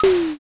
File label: door open